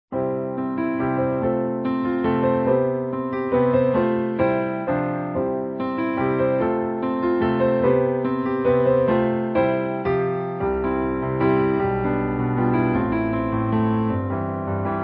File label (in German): Pianoman